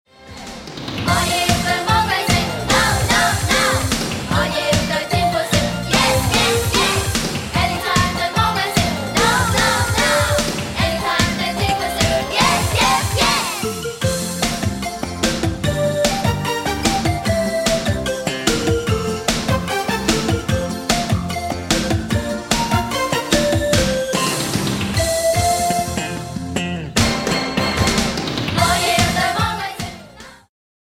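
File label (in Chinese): Karaoke, 兒童詩歌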